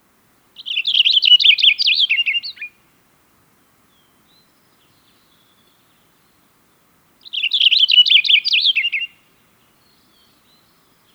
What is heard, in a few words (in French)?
Finch de Cassin chant